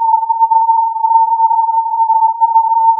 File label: filtered input